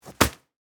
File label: Sand Bag Drop, On, Ground; BlastWave